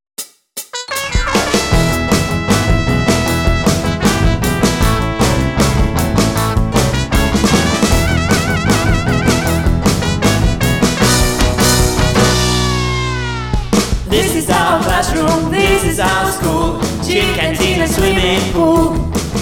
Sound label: Rock n Roll